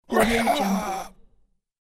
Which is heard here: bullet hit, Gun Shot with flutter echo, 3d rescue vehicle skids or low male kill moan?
low male kill moan